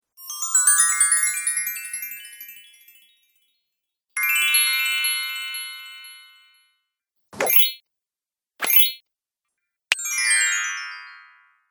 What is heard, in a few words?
Fairy Sounds Sparkle; Magic Sound Effects; Pack 2